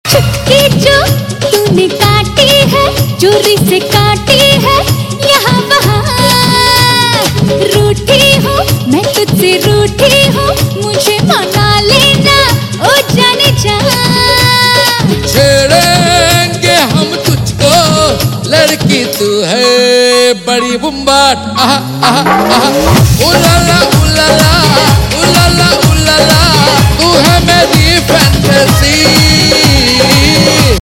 Ringtone

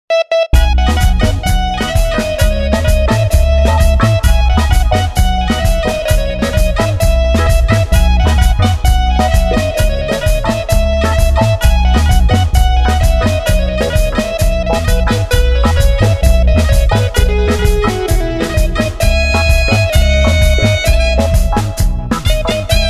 Instrumental Tone; Khiladi 786

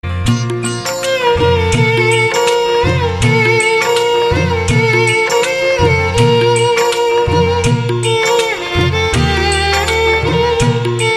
Instrumental

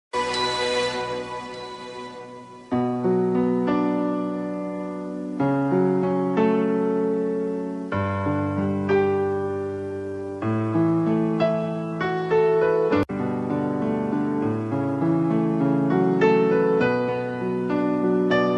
Instrumental; Tv Serials; Zee TV; Phir Subah Hogi

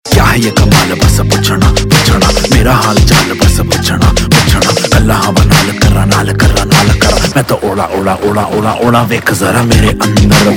Rap Ringtone, Bollywood; Udta Punjab

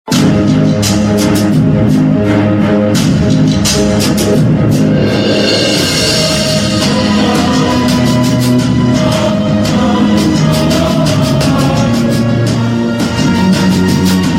Dramatic Tune

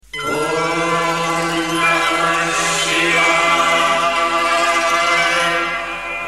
Om Namah Shivay; Shiv - Bholenath

